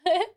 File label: heh